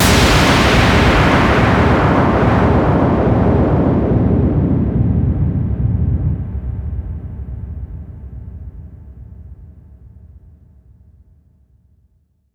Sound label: Hits & Explode